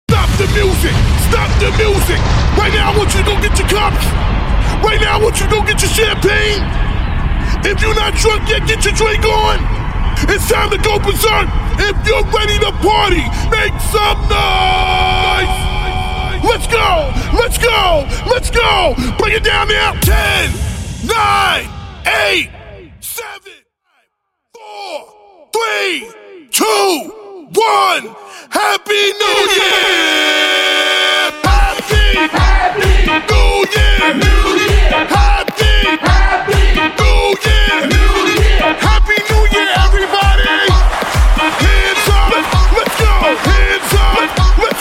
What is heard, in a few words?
NYE Countdown